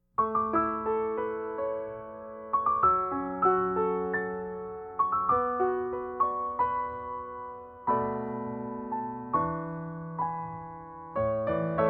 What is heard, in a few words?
Reflection Accompaniment